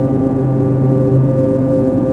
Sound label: shuttle hover; movers objects